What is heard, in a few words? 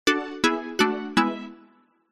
multimedia game sound advance award nylon plucked synth